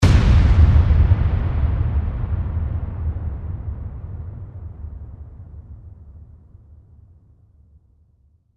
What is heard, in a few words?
Explosion Bomb, Explosion, Bomb, Blast, Production Element, Imaging Element, Accent, Transition; Hit Sound Effects